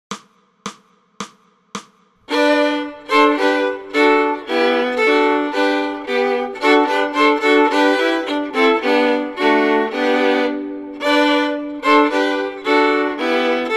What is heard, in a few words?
Fiddle; Deck the Halls